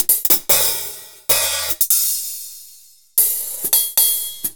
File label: Yamaha SU700 HiHats